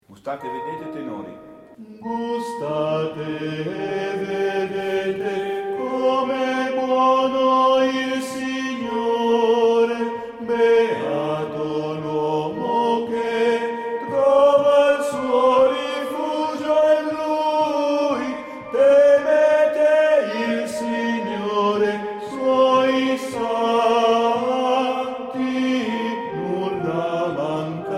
Gustate e vedete Ten Voc; Visita Arcivescovo

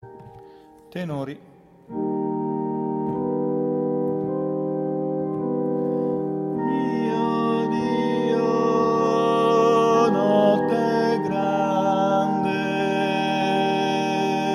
Tenori; Natale; Mio Dio notte grande

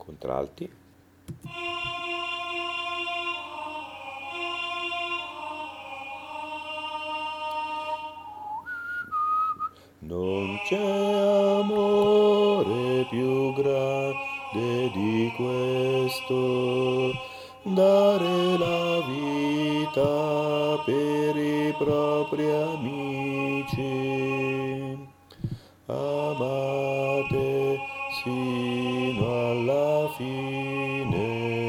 Contralti

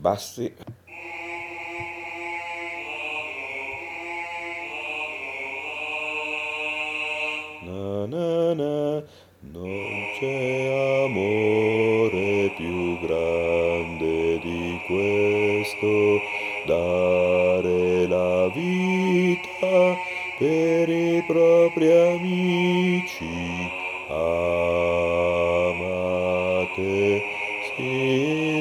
Bassi

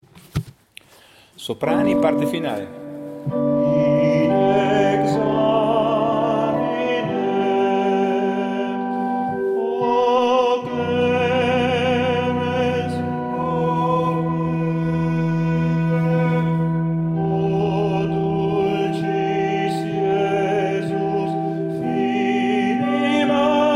Sop Cant; Ave Verum corpus-E.Edgar

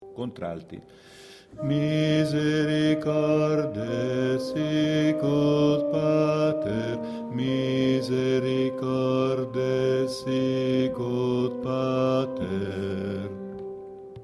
Contralti

